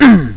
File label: hit; BattleSNDS